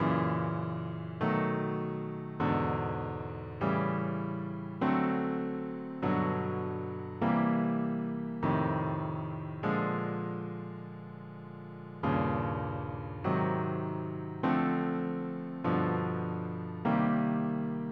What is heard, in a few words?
rhythm changes cycle of 4ths